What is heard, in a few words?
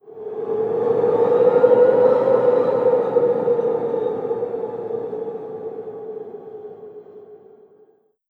Amb Ice Intro